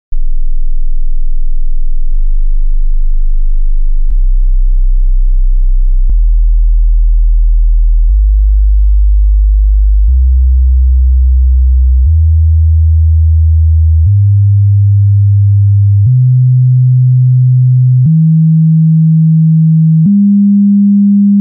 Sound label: 3 step oct; noise